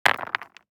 DICE on Neoprene, Throw and Roll, Mini, 6 Six Dice; Samples; ArticulatedSounds; DICE Sfx Party (Extended)